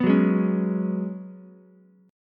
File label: Gb Suspended 2nd Suspended 4th down Ukulele; Gbsus2sus4 strummed